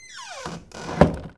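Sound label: door close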